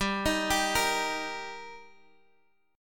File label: G Minor Sharp 5th G down Guitar Half Step Down